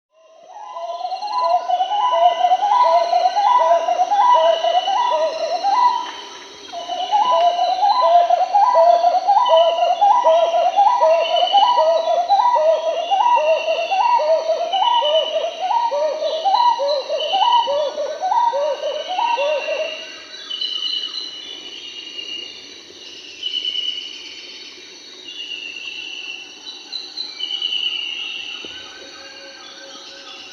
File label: Marbled Wood-Quail (Odontophorus gujanensis), Peru; S. America